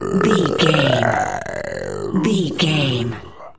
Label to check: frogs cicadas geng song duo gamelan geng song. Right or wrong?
Wrong